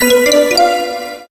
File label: win; Amatic; Book of Fortune